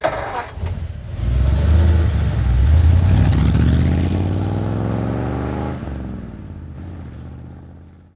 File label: 1947 Ford V8 Truck Ext Start Fast Away; Period Vehicles